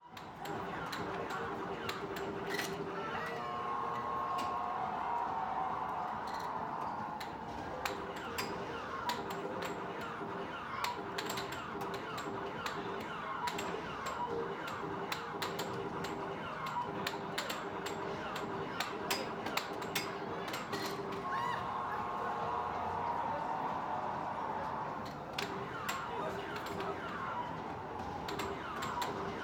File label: Crowd Interior Small Size, Arcade, Air Hockey, Video Games, Voices; Ambisonic; 2496SoundEffects; Ambisonic Crowds